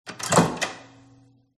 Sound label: open microwave; fox audio